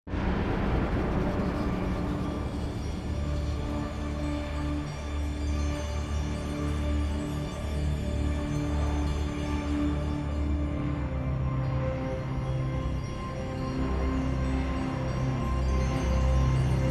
the final ascent dronar metal

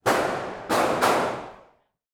distant rifle gun fire

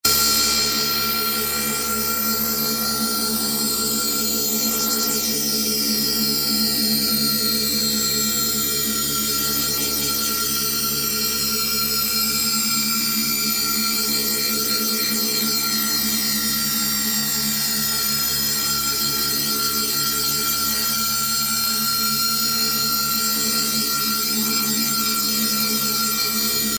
60s horror

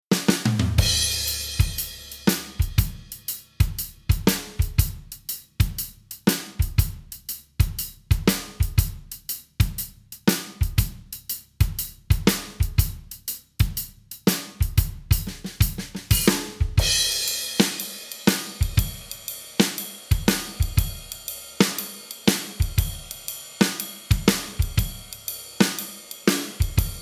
swampy

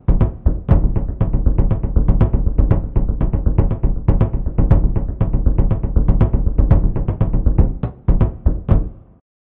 brazilian surdo drum muted

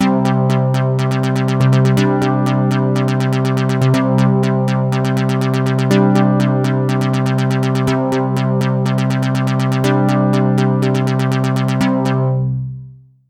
brite fifths

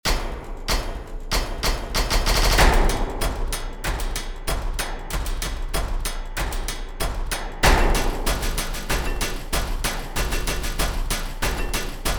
klang bang

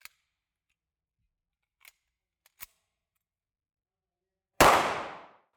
cock and shoot 9mm gun shot

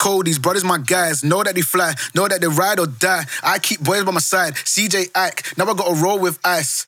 vocal male before

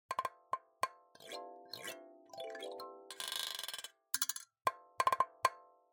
kalimba effects

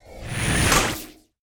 shock amo pickup

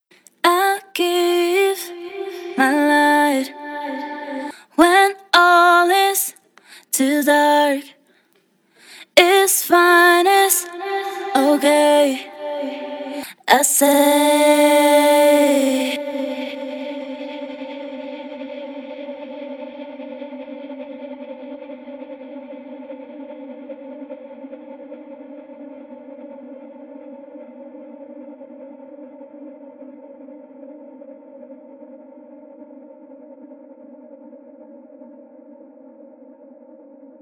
female vocal swell

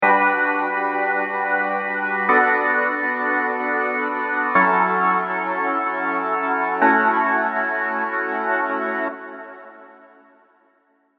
serum pads; chiqui audio

